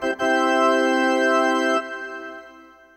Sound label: tada; Paparazzi